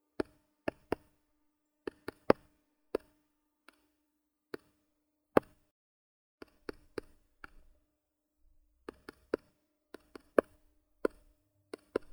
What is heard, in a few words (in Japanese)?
タッピング ASMR; ２年B組・電気未来編